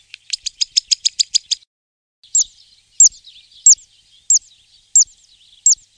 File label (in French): cisticole; Faune; Oiseaux